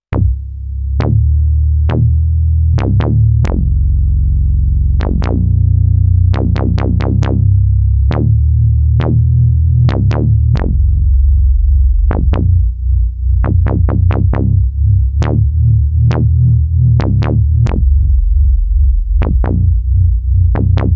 Tiefer Bass

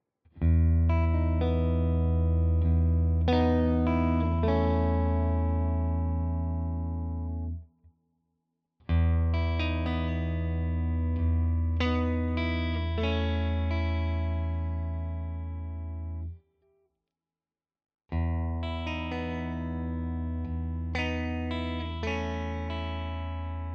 Ibanez AZ SDB clean Arps all positions vergleichend

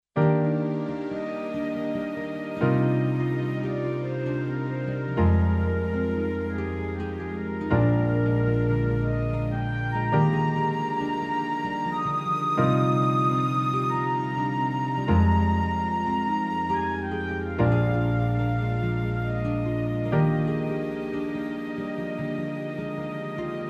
woodwind flute mit begleitung

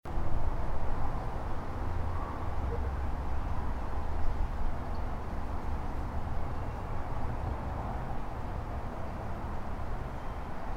Zoom MicTrak M4 Aussenaufnahme

